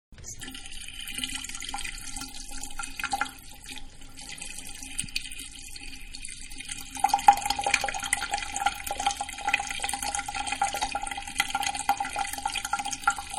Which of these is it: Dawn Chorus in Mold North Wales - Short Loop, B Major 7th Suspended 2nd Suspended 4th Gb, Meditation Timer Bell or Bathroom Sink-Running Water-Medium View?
Bathroom Sink-Running Water-Medium View